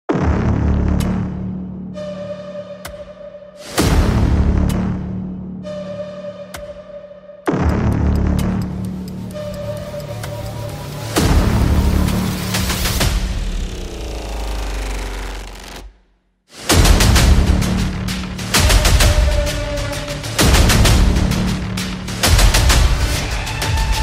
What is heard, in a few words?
movie bgm ringtone; ramarao on duty